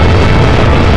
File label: missile projectile, weapons; Tribes2